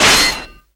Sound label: Strike; .hack//G.U. Last Recode; ps4 gamerip 2017